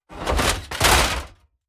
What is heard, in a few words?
Battle Spartans Form Phalanx With Metal Shields